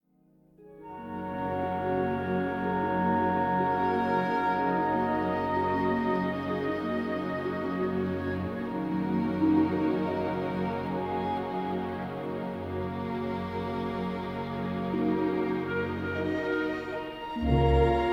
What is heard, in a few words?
Asia - Peace - Northern Forest; Civilization V